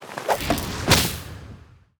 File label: attack; tenshi